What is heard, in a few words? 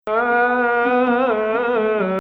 Nahawand; Nahawand 8